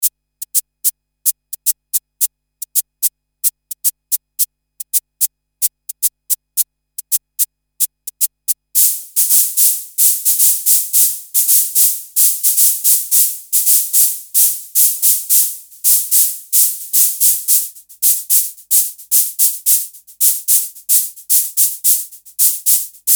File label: Maracas